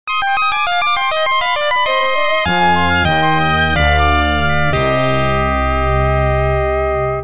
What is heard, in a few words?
fail